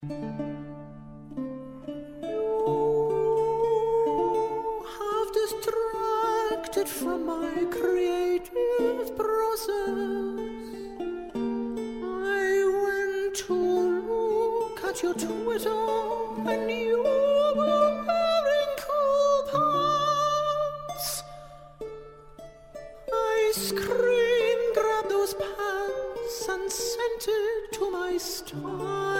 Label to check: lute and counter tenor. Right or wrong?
Right